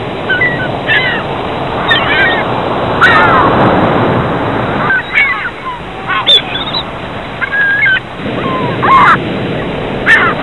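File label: seagulls war, ambience; cstrike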